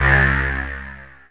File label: Glare; Easyrpg; rtp2k